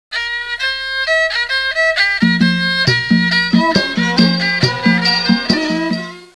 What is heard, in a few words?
Hooka Music; Apeiron 1.0.1; Ambrosia SW